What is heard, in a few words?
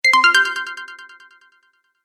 Multimedia Alert Sound Effect, Medium Length, Chime; Chimes; Stuart Duffield